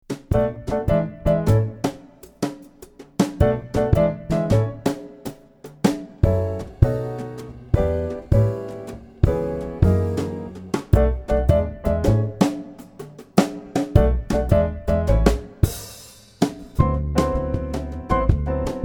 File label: JAZZ ROMANTIC COMEDY THEME cocktail party